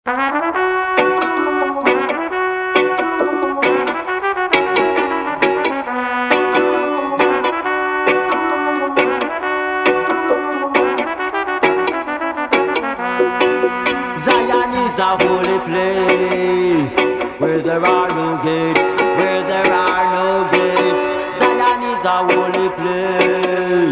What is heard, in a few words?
Holy Dub